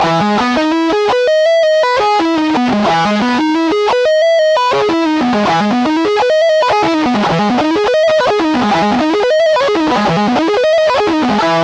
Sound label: Creative Arpeggios Example